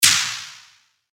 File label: PERC Elektro, percussion; revealed percussion vol 3